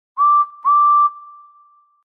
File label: blue engine whistle